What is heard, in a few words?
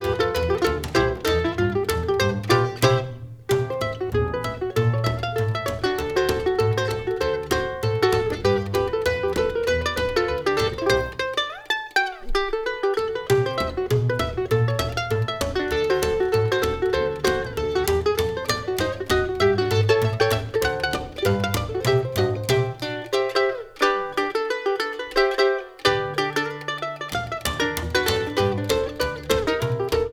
musicbox